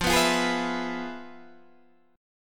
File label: F Minor Major 7th Flat 5th Guitar Standard; FmM7b5 strummed; JGuitar